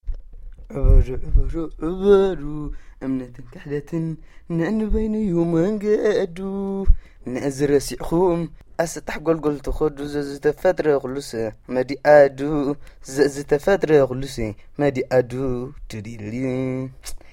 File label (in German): Gesang, Schulen